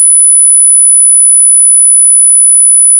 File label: 10kHz, APTX; sbc-aptx